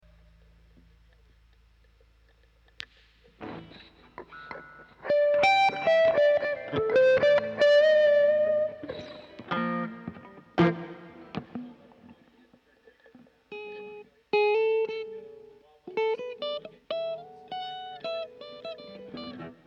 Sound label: Strings